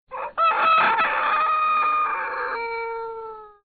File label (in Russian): krik hriplogo petuha